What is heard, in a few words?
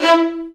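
Violin Spiccato E3; Multisamples; Digital Sound Factory Virtuoso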